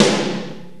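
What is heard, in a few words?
POWER SNARE, MPC-60 DRUMS; Greytsounds VOL-1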